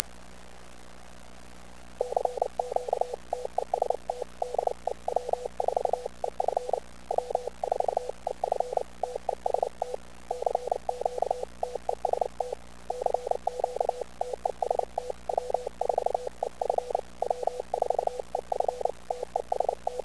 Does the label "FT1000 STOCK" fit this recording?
Yes